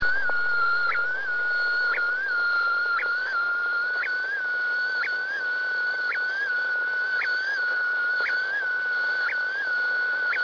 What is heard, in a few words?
salyut 6 ecg